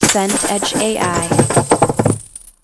rockfall

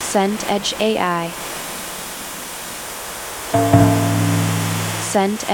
rain torrential

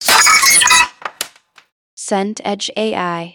smash wood

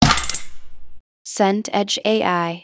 bin pedal lid

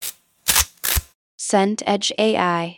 peeler potato

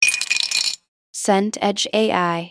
ice into glass

